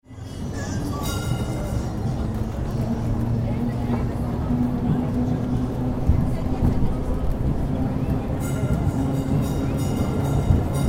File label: tram, Istanbul; Beyoğlu; sesli gunluk istanbul